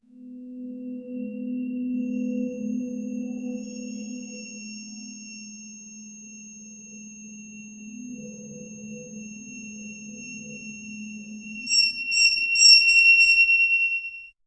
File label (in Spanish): atmosfera sonora tensa de; ambient music